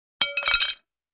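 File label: Large Tile Square Pieces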